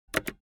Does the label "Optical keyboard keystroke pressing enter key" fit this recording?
Yes